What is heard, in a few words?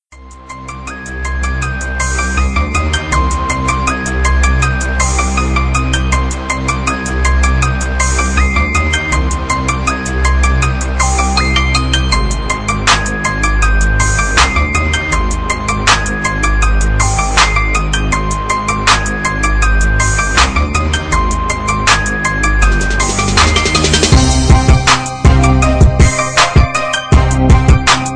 Instrumental